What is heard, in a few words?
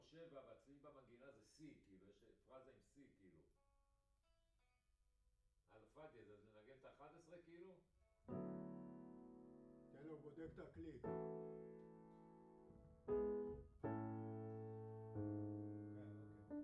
Piano A